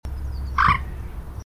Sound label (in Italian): gallinella, Gallinella d'acqua; Moorhen; Gallinula chloropus; Gruiformes; Rallidae; call; Venezia, Italy